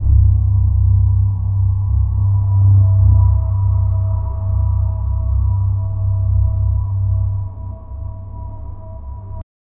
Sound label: dark ambient drone with